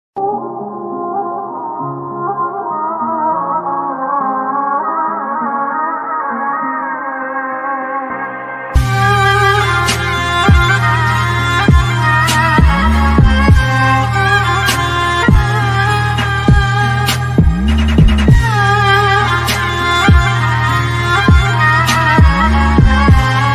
Islamic BGM ringtone

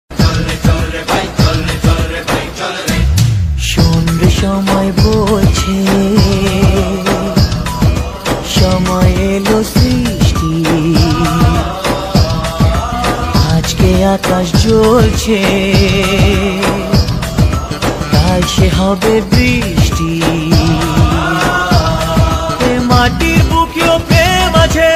bengali ringtone

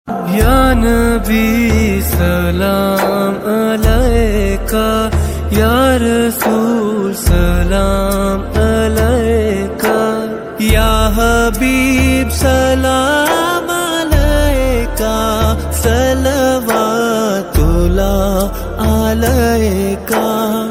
islamic ringtone

